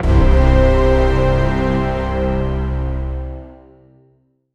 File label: a short orchestral advent, score